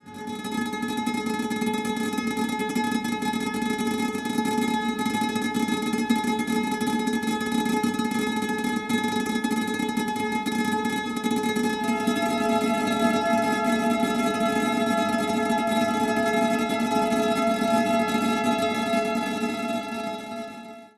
surgical EQ before

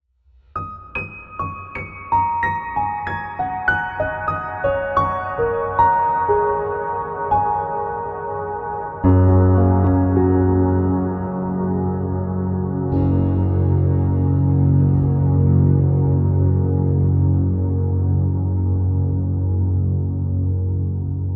Piano and Guitar Little Plate Long Tail

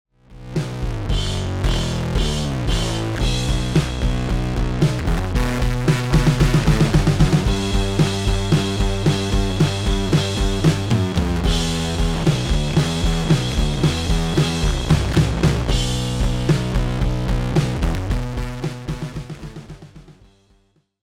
Bass with Two Decapitators

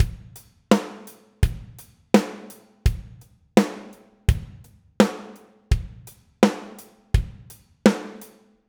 drums, accents

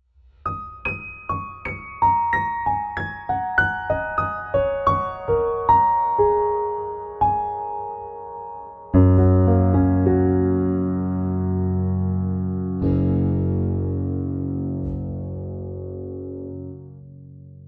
piano and guitar no verb

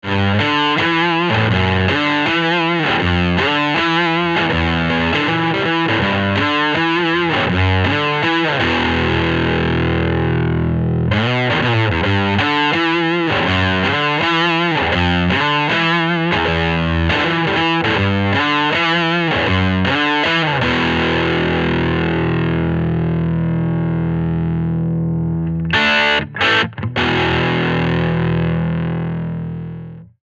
holy fire into tube drive; How to Get a Fatter Guitar Sound with Cascading Overdrive

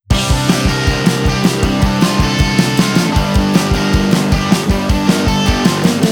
drum splat after

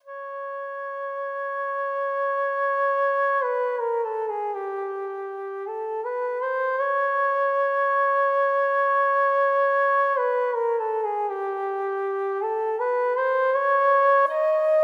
convolution reverb; SWAM

